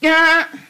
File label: laugh; sponge bob